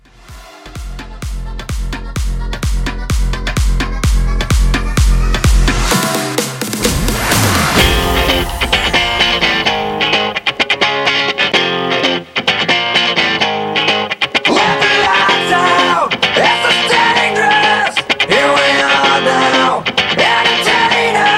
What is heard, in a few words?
Festival Hybrid Edit